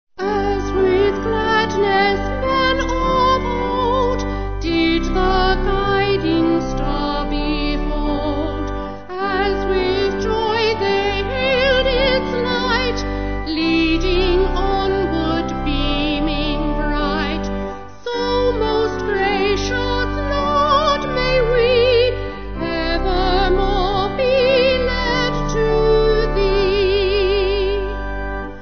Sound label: Vocals Organ